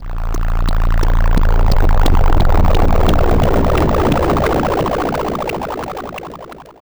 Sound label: Spooky